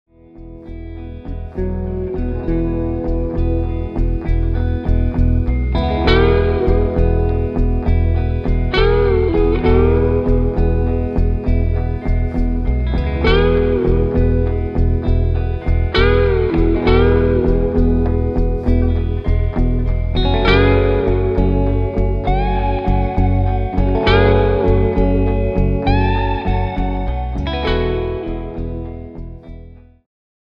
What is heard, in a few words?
wo Drums